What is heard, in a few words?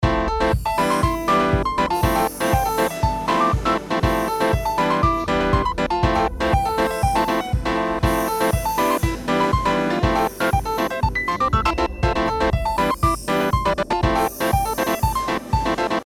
release cut piano; cubase